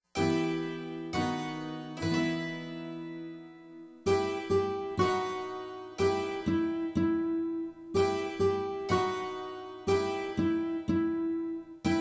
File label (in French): Le Vent Instrumentale